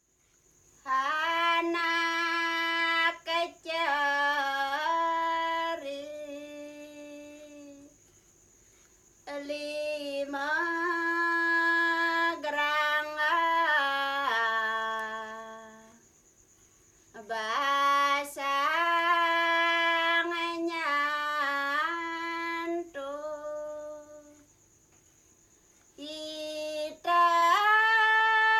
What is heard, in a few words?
gending rare; Asak; Anak Cerik; 1972